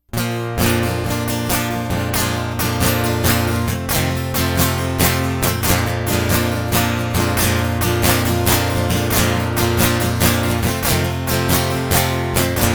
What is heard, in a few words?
Guitar Loop